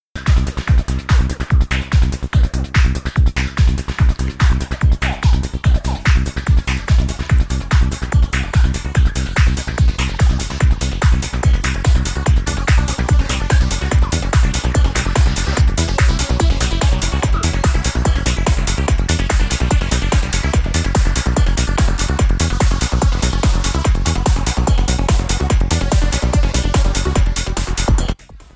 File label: partials fade